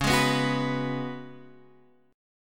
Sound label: Db 7th Suspended 4th Db down; strummed